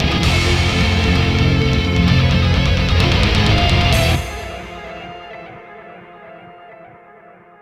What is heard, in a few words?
Jingle Defeat